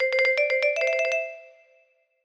Jingles Shield

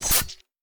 Dagger Hit Right To Left; we are the dwarves gamerip 2016

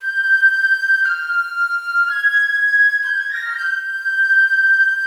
SFX Wood Wind Melo

